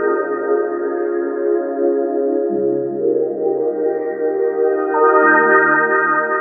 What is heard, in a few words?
SEQ, Jungle; E-Lab; XTC Files of Jungle